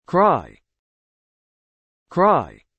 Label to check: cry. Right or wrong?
Right